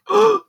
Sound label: Shouting In